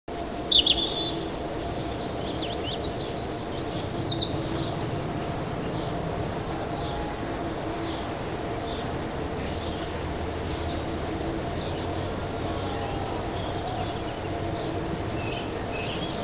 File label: Space Center Ambience Day; kerbal space program complete soundtrack